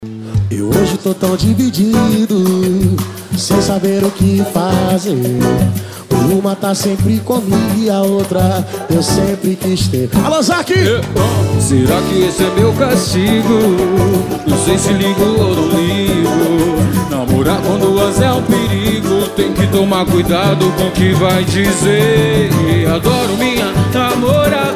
pagode e sertanejo; xtreme sound

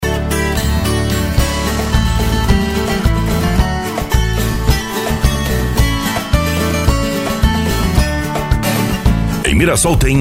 pagode de viola

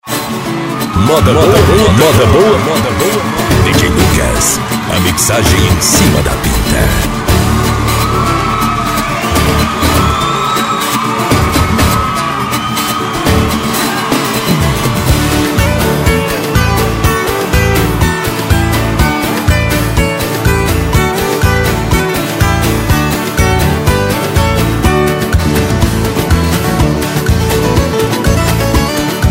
viola; piracicabano; cd moda boa 2015

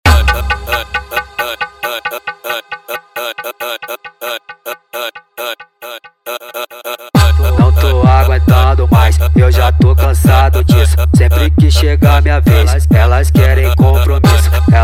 especial funk mega bass

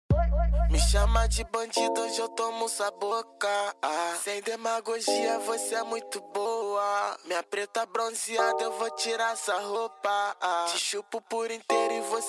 esp pancada

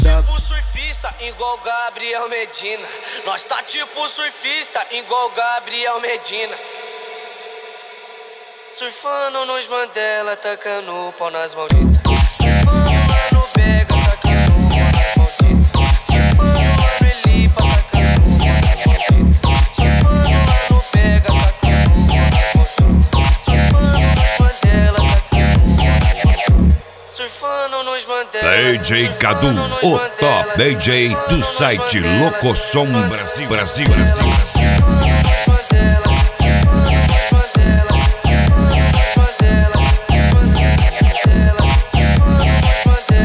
mega funk; set mega funk vol2; especial mega funk vol2 agosto

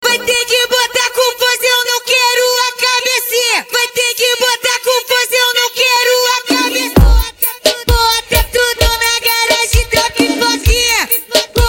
racha eletronica e funk mega bass

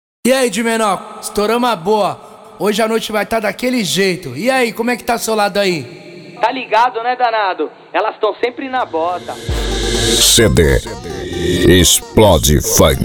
explode funk

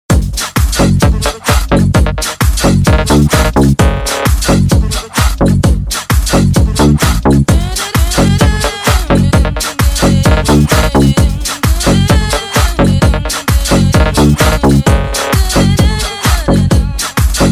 mega funk

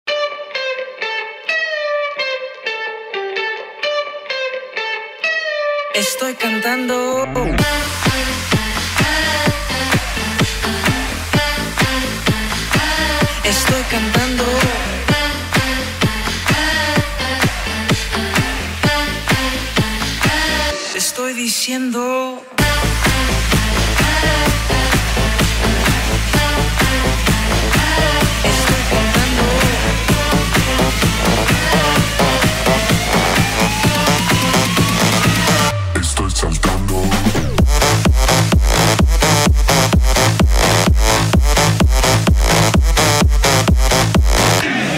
energy vibe eletronic music

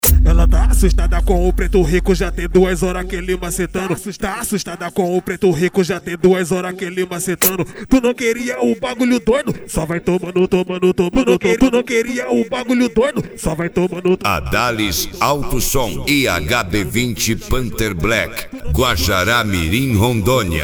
esp de cavalgada

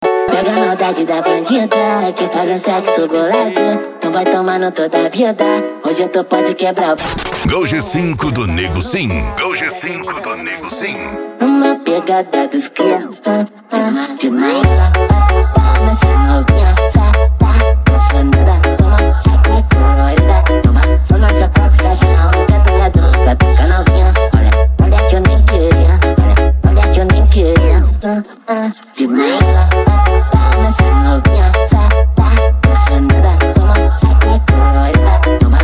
funk mega bass e noia dance

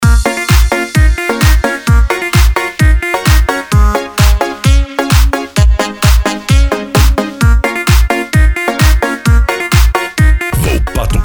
sertanejo remix

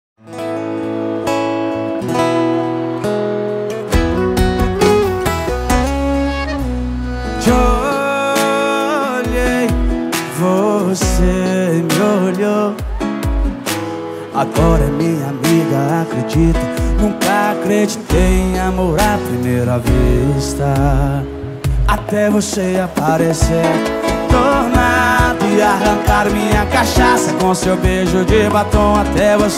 sertanejo sofrencia e romanticas

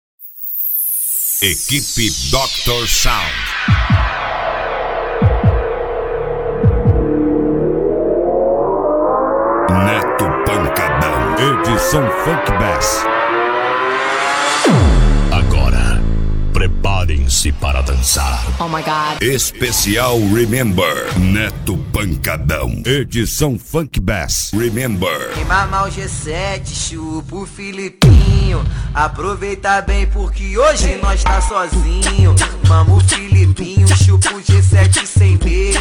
funk bass remember

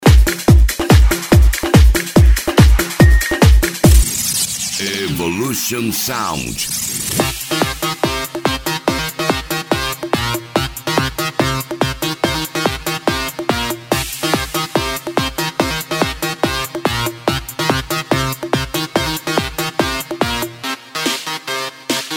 esp de pancada

